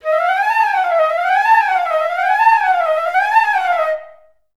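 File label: flute run up down A#3; Best Service; Peter Siedlaczek's Advanced Orchestra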